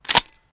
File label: weapon foley drop; Real Guns Sound Pack 2